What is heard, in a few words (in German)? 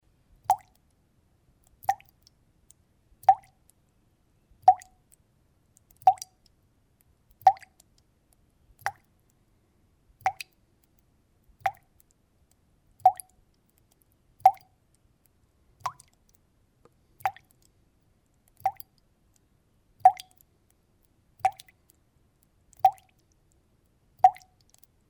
Wassertropfen